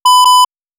Calibration signal; 96-16

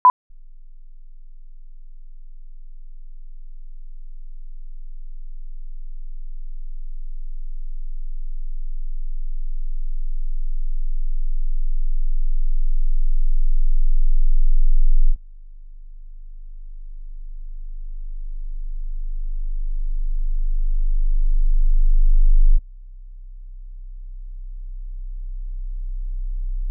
Test signal THD set of tones 20-20k; 44 kHz 24-bit

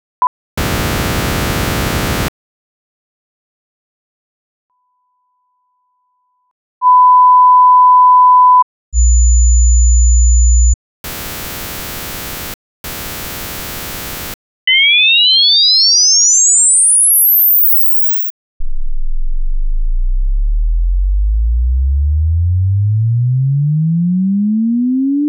Test signal silence intro; 48 kHz 24-bit

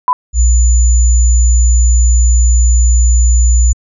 Test signal (44 kHz 24-bit) IMD+Noise

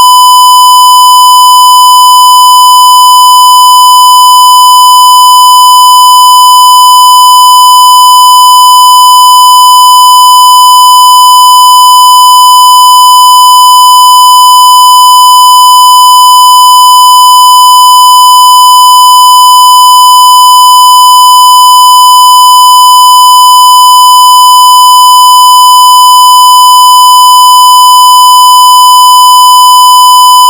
1kHz square 0dBFS

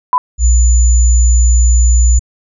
Test signal IMD+Noise; 44 kHz 24-bit

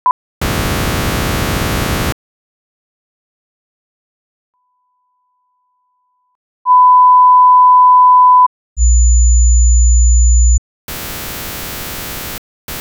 Test signal; 48 kHz 24-bit

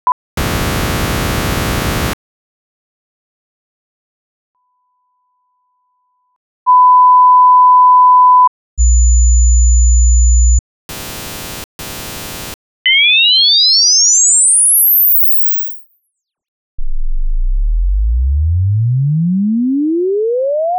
Test signal; 96 kHz 24-bit

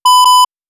Calibration signal; 48-16

